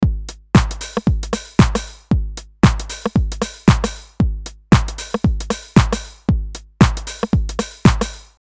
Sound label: e kit drums